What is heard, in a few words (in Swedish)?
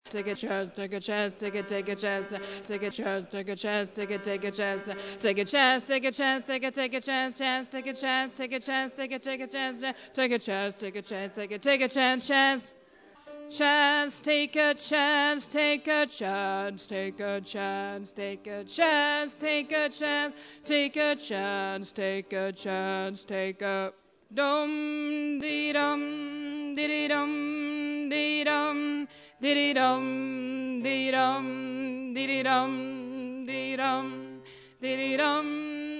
bas, stammor